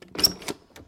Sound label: Door Open